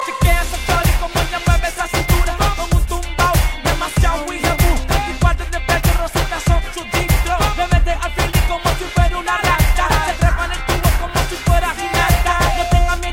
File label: Tumbao